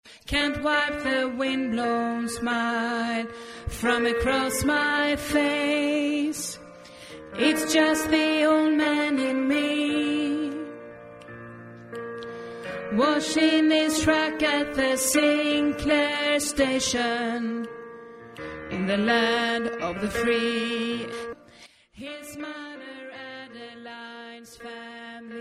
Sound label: tenor